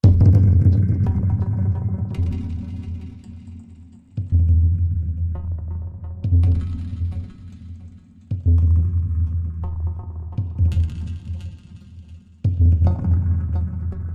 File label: Down Shift Tumbling Bea, eerie ambience